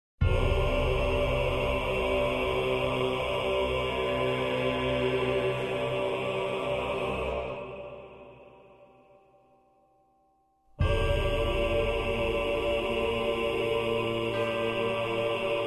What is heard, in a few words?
ancient dragon choir; dark souls; the ancient dragon